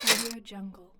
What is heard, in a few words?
scifi inventory mechanical item